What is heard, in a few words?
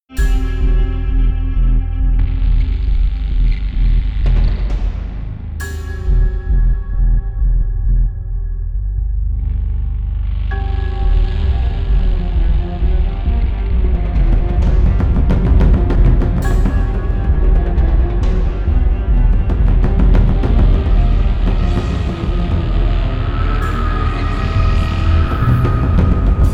New Organ; House Of Cards Season 4 (2016); خانه پوشالی: فصل چهارم